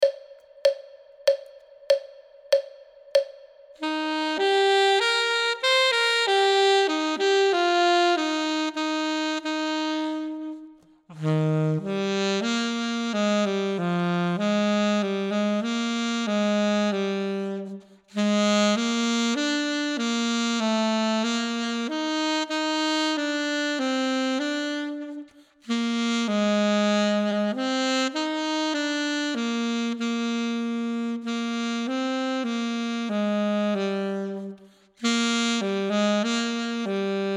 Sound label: duet part 2; Ten Easy Duets